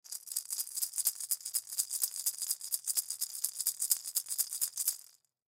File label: Maraca Plastic, Maraca Plastic Small Shake Multiple; Shaker Sound Effects; Musical Maraca Shake Multiple; Blastwave